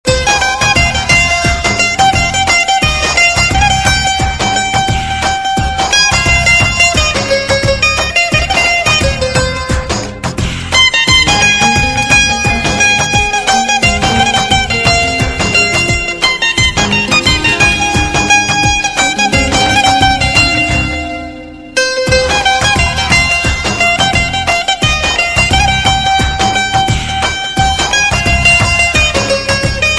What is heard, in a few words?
sad mobile ringtone